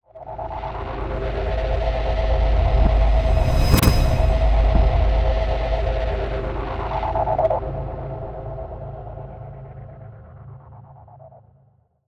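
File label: Grate Your Teeth reverse forward, Cinematic Metal Impacts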